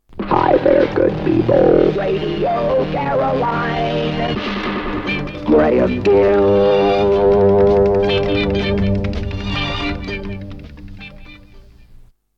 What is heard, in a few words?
jingle; Radio Caroline